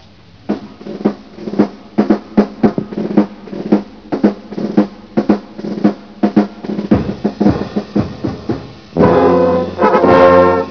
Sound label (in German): Tambour